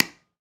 Pickelball Paddle Hit Ground Medium, Ball Collision